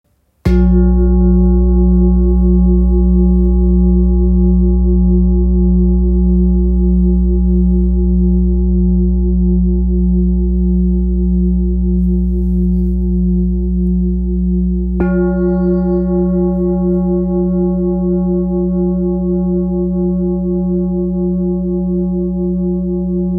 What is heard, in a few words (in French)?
Bol grave 7 chakras fa diese 8.17kg